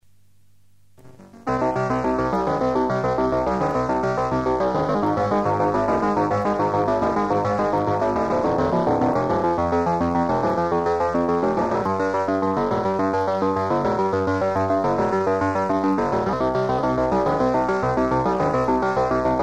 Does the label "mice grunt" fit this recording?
No